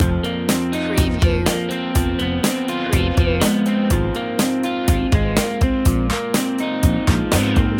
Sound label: loop, chill